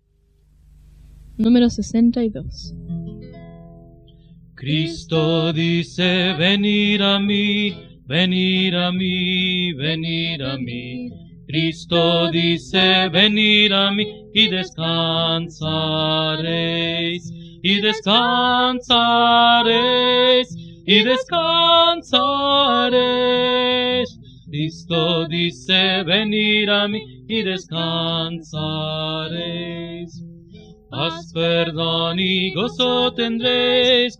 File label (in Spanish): Coro, himnario niños